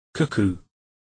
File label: cuckoo